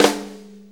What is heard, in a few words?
Blues Snare Flam; Sonic Foundry (Sony Creative Software); Loops & Samples Drum Tools; Flams